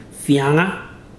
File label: snort; ibibio